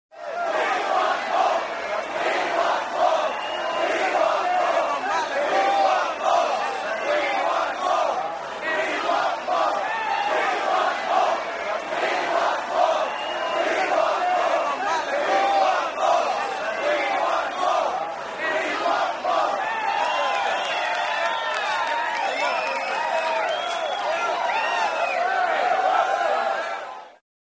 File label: Large Crowd Chants